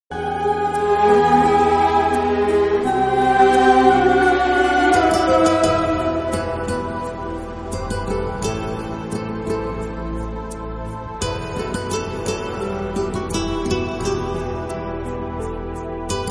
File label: romantic instrumental ringtone; veer zaara